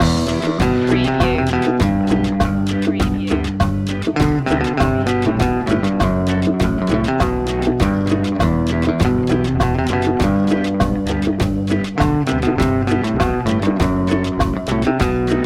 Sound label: latin loop